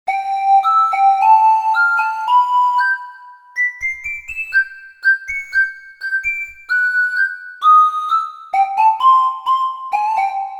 Ocarina, Roland JV-880; Factory Patches

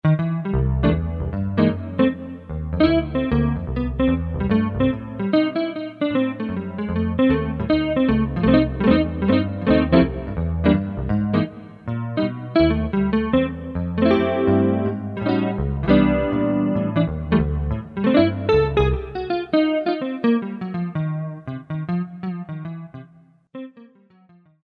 Stereo Jazz Guit, Kurzweil K2000; GUITAR; Kurzweil K2000 Value Pack; Extra Programs; GUITAR1X

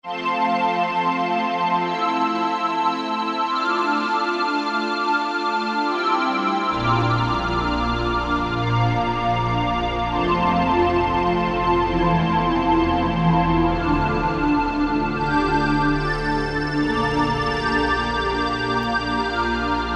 Juneau Pad, Alesis Z5; Vintage Synthesizers And Classic Beatboxes

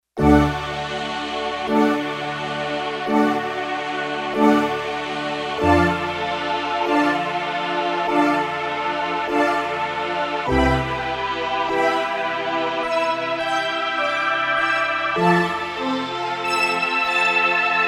Future Stack

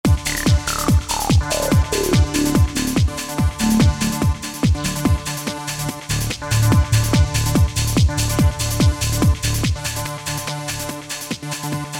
Smash Beat

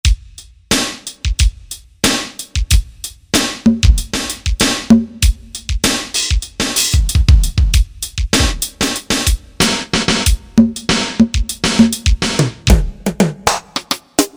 Drum Kit, synth

